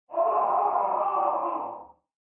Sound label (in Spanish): fondo grito hombre